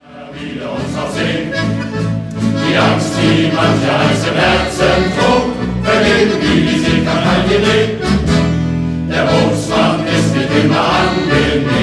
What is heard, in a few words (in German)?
Shanty Chor